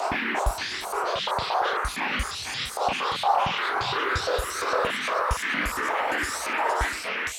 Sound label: Moving Noise 130, Tempo Loops 130bpm